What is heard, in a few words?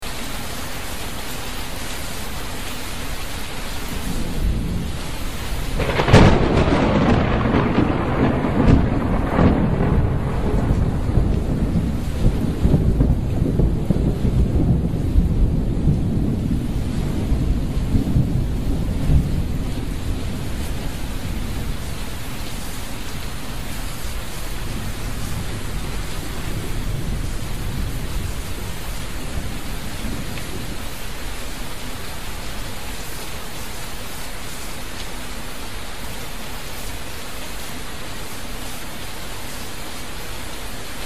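3d sound of lightning rain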